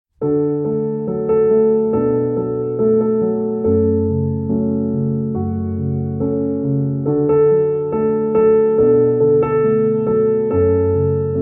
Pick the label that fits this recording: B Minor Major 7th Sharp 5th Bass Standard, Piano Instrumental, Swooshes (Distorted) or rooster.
Piano Instrumental